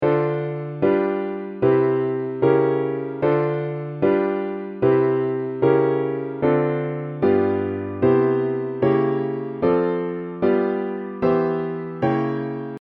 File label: piano Chords